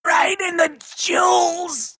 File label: meepo death; Dota 2